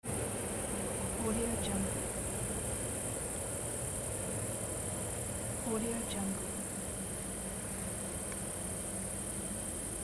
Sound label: night ambience